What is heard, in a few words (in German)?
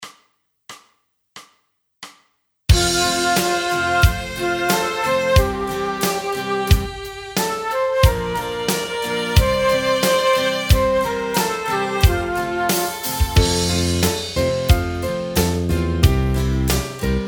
halleluja instr